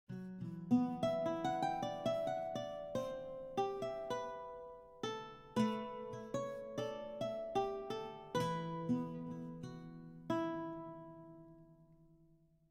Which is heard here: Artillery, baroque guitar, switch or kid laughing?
baroque guitar